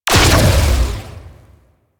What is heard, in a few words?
Secondary Barrel Fire, Weapons; Warframe; SU Modular Guns